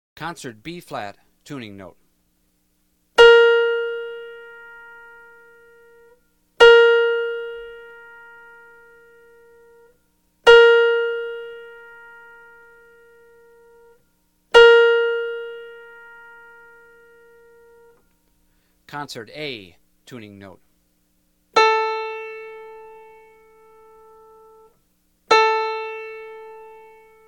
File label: Tuning Notes; James „Jamey“ Aebersold Play-Alongs; Collectors' Items